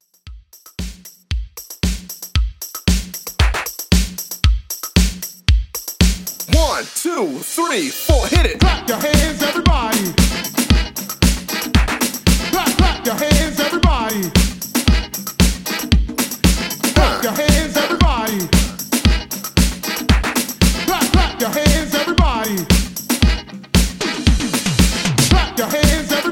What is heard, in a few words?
Hype Classic Redrum 115 Bpm